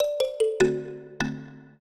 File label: trio, mbira; Mozart